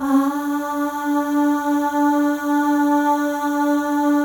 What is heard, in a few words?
AHS, FEM; Spectrasonics; FEM.1 AHS